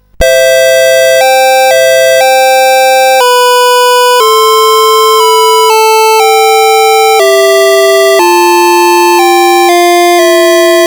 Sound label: Flute Room, CSG8580R5